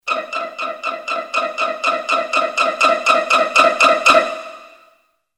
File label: Classic Horror Pizzicato; Soundzabound Volume 3 Disc 2